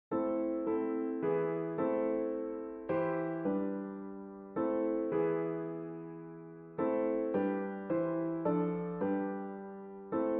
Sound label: coventry carol keyboard; Christmas